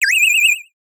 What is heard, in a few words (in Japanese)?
キュイン; ボードゲームやカジノ、麻雀の効果音です